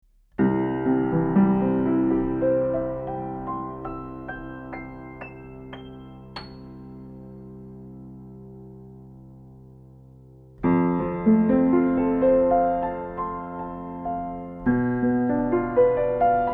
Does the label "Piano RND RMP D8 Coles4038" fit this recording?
Yes